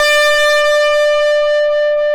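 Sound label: distortion guitar D4, GUITAR; AKAI S-Series CD-ROM Sound Library VOL-8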